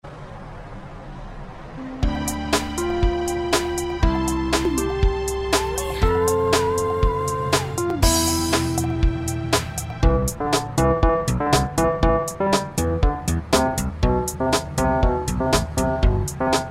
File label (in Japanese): 穏やか; 明るい